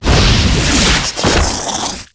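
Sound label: thief spawn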